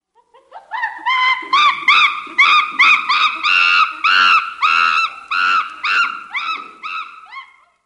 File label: monkey voice call notification tone